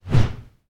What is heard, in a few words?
Whoosh Short